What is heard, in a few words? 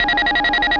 SONAR JAM